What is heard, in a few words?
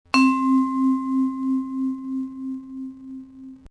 percu; Mobiclic51